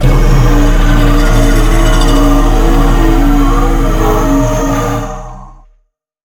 head hum long

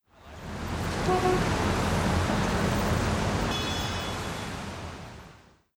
CITY DAY

